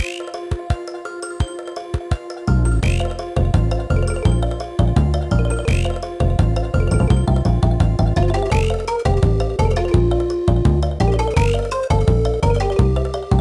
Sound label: Conga; Donkey Kong Country 2- Diddy's Kong Quest